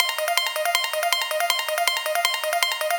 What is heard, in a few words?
Seq Fast loop, Drum & Bass; Sonic Foundry (Sony Creative Software); Knutrix Drum & Bass